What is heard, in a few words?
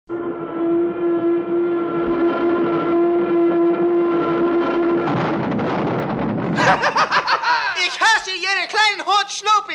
Crash